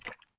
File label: fs mud, footsteps